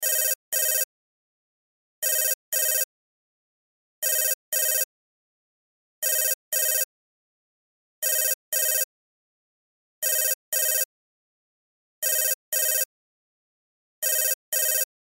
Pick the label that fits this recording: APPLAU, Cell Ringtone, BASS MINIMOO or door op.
Cell Ringtone